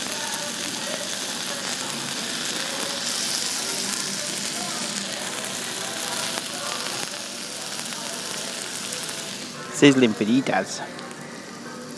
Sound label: sizzling fajitas